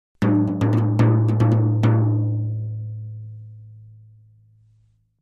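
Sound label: Drums Toms Medium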